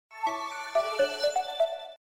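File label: power off; LG; SU780-Lollipop-3; SKT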